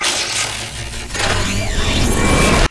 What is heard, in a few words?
spectre overload close; Titanfall 2